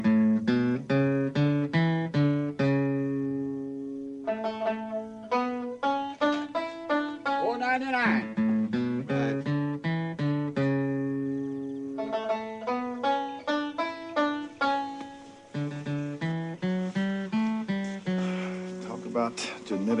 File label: Banjos, ringtone